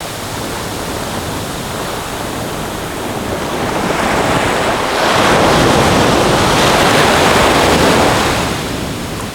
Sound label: Waves; gnarled forest